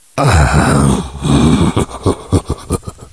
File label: laugh; svencoop; echoes; gman